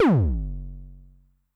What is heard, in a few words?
BD MOD [Pitch+Depth+Overdrive], 909mod, BASS DRUM